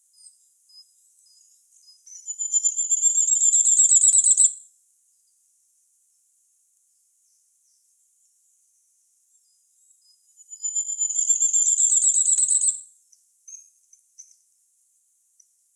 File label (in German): Sommergoldhaehnchen; Ornithologie